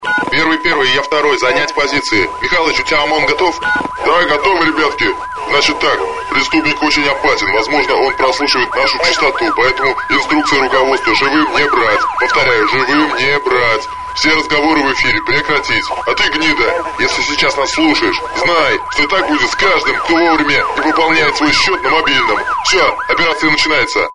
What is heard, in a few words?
policeiskaya volna, tones